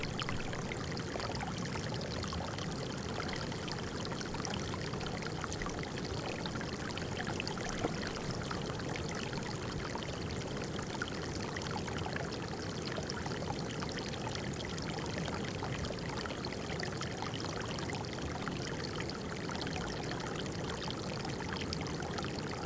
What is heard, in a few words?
Hidden Place Spring Close; field-recordings; grenivik